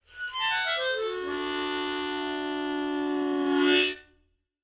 Mouth-organ, Descending Chords Whit Long End Type 1 - 7th Chord; woodwinds